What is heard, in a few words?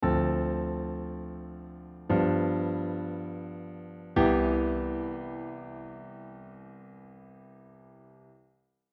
voice leading